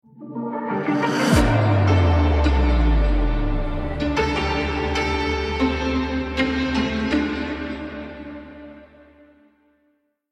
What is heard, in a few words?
lose; Valorant; cstrike